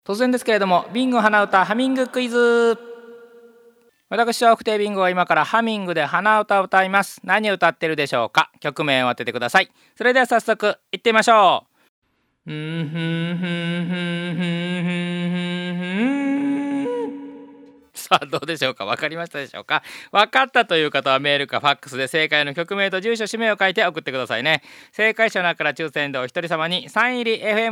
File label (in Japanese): hanauta